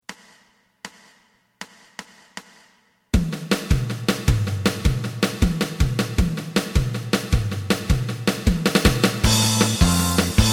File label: lead